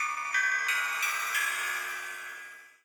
spooky Occurence; Voidspire Tactics

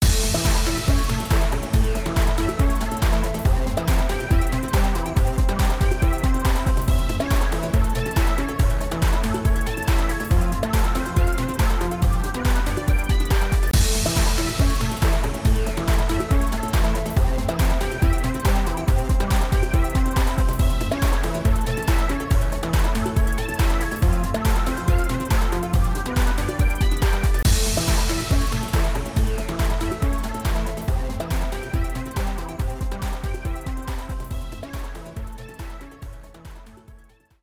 Music Space; lego brawls gamerip 2022; Music Space MVP

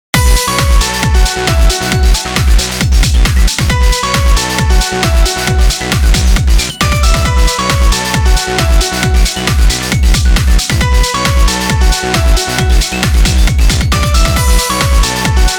(Instrumental Edition); thunderstorm field

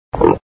Boss Damage; Angry Duck Cannon 1.5

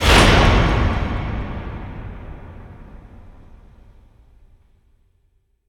End Combat Sting; Void Bastards

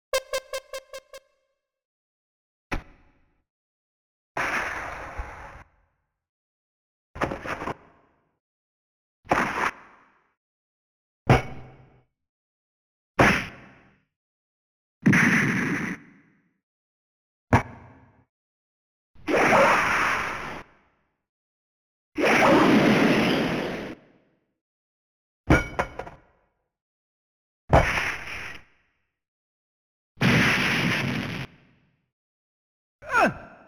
S.E. & Voice Collection; U.S. Championship V'Ball

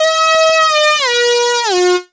Beat

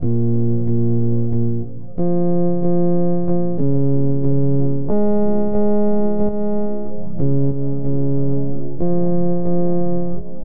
loop

